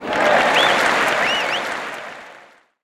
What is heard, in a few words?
audience cheer; HeavenStudio; quiz Show